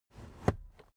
car headrest full adjustment hard slow

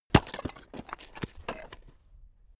stone hit ground shatter; natur-tiere; steine

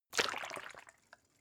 bottle water upend slow; berufe

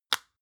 lotion large lid close medium; berufe; friseur

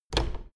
door plastic handle pull down hard

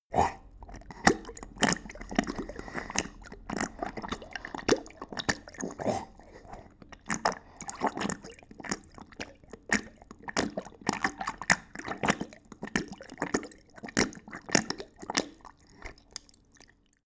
dog drink; natur-tiere; hunde

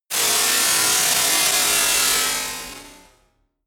buzz saw short; zaps-buzzes

